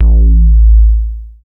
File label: BASS